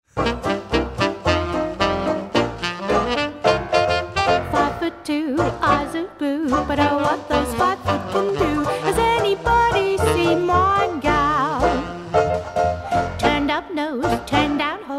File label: 78rpm